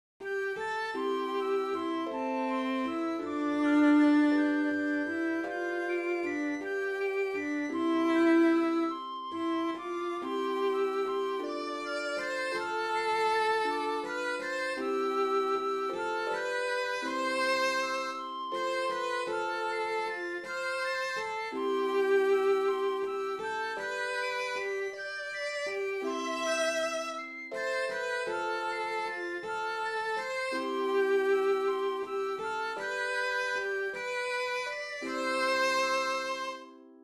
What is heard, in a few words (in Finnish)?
soinnut